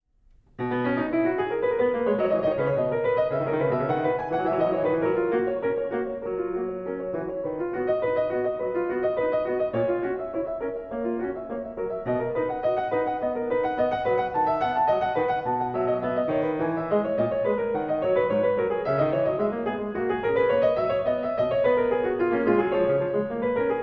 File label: Prelude And Fugue No 23 In B Major BWV 892 Praeludium, Clavier; Johann Sebastian Bach